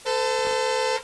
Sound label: Carhorn sound; Sony Ericsson; P800